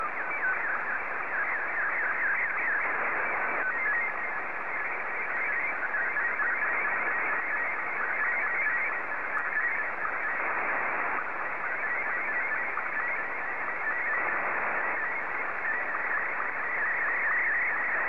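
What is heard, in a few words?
SSTV, Martin 2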